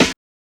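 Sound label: HSE SD, house snare drum; X-Static Goldmine 1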